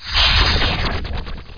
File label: Magic; THE REALM